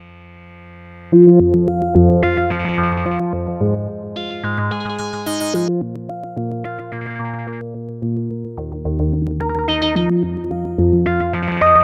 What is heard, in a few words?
Synthesizer Reverb Plate Oceans 12